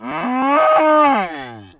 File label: ronto vocalize